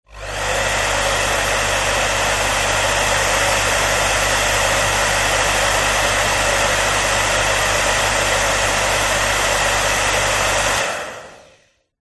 household hairdryer on run static off rear professional; visage; zapsplat